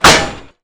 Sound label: knife metal; cso; tknife